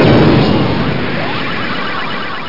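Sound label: trap crash; trapped2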